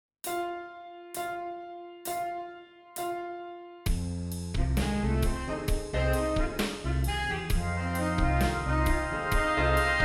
Horns in Fifths

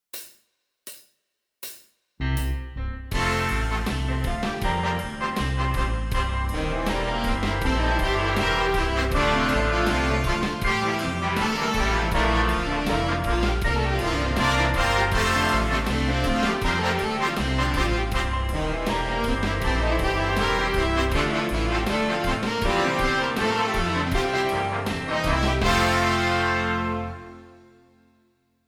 Joy Horns in Fifths; Beethovens

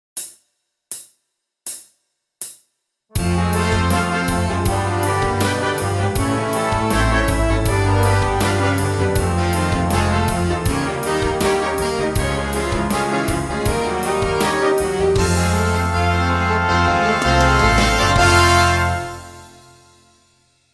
Basic Daily Warm up

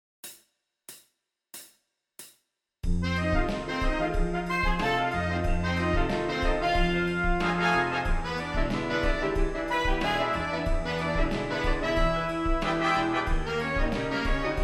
Lip Slur 3 Horns in Fifths

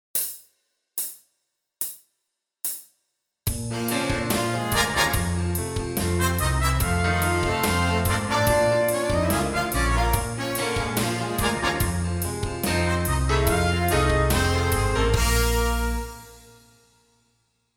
Rhythm Study

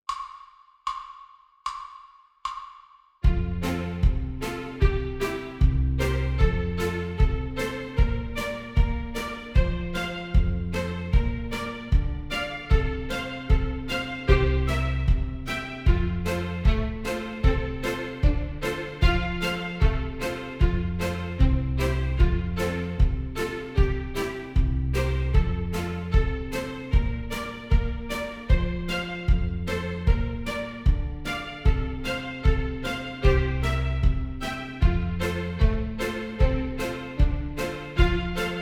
Horns in Fifths

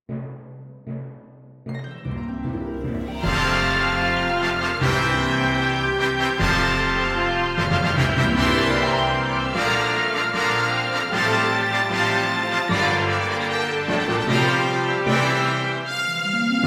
Horns in Fifths

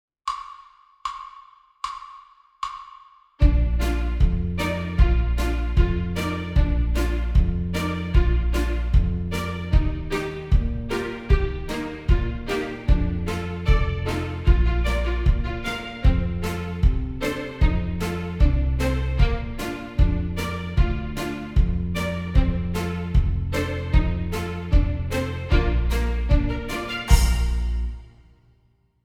Rhythm Prep

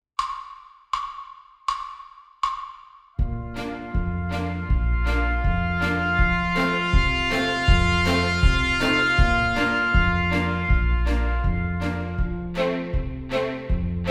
Crescendo Diminuendo

